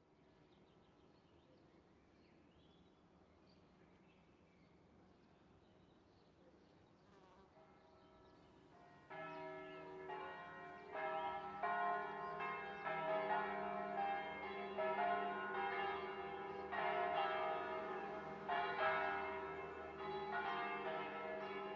ambience town north italy birds ligth traffic church bells calling to mass; surround; fvtt sonniss gdc audio